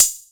TR606 Hat, Roland Tr-606; Drums

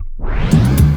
SPINNBACK; USB Soundscan vol.27

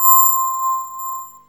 bellish, synth bells; Zero-G Ambient 1

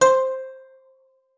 spinet; Xsample VOL-7

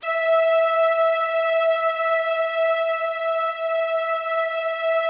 E4, STRINGS, Yamaha VP-1; Music Store; JODYSTRINGS

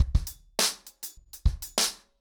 100BPM, ACOUSTIC DRUMS

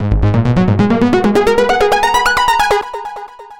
NRG Arp, trance

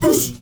MALE WAR, SHOUT

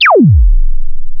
zap e2, CASIO FZ-1 Special FX; ProSamples vol.55 - Retro Sampler

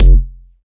kick drive; kicks

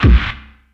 SP1200 KIC, Kix Snares; E-MU Formula 4000 Series Vol. 1; Hip Hop Nation

